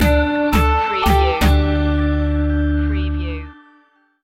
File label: latin caribbean reggaeton stinger